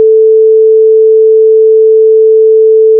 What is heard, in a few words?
pure 440